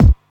phat kick single shot F sharp key, Royality free kick single shot tuned to the F# note; kick drum samples